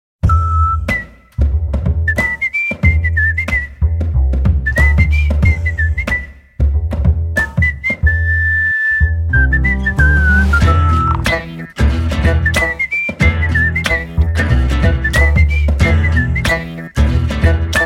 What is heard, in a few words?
Whistle; Marina